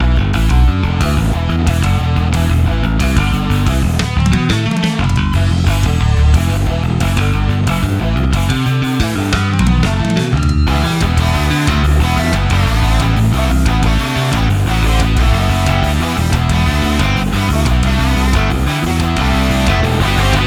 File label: Warthog Distortion; Minecraft Retro Arcade Action Original Soundtrack 2024